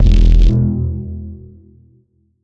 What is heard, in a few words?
rad pulse